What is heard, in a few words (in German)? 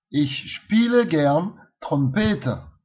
hei spiele trompete